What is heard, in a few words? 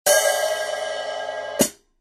12in Special Recording HiHats, cymbal sound